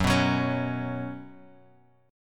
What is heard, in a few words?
Gb Suspended 4th, Bass Standard; strummed; JGuitar